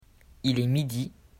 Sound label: midi